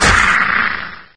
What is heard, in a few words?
Jumper Death